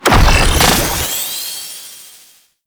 Celestial Twin Cast; Warframe; Infested Lich

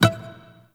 Shawzin Wrong Note; Warframe

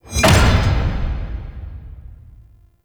Select; UI; Hell Floor; Warframe; Tau Mission Menu

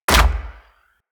Hunter Bow Ranged Shot Punch; Weapons; Player; Envoy; Bows

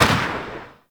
Void Dragon Orb Charge Explosion; Enemies; Abilities; Warframe; Duviri

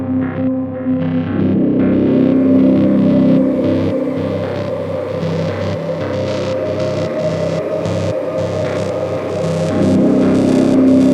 Forest Night End Music; Warframe; Cinematics; The Sacrifice; Sacrifice Quest

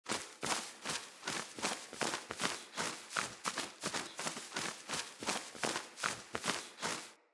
Acorn Cleanse Rat Wing Flaps; Cinematics; Bromius; Bromius Quest Acorn Cleanse

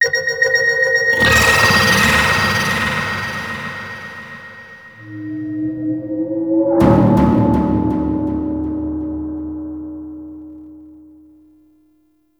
Harvester Warning; Alad V; Corpus

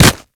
Kubrow Run Snow, Footsteps; Warframe

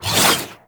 Whoosh, weapons; Broken Frame Warfan

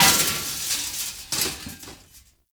Chair Stack Destroy; Ambience; Gameplay; Warframe; Nineteen Ninety Nine

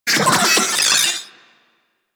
Vocal Alerted; Warframe; Corpus; Mini Moa

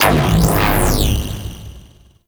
ricochet activate; Cowgirl

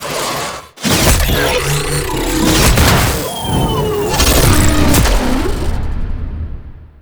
Finisher D; Jackal Redux; Cinematics

